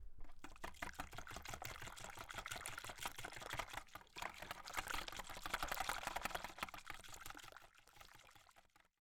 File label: Milk Mix